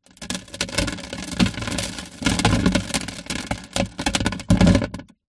dirt drop onto empty plastic box inside perspective